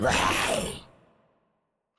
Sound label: Spirit Death VO